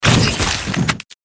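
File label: damage critical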